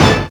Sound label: techno; E-Lab; X Static Goldmine 1